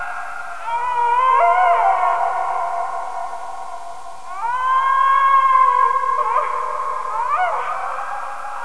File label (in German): wehklagen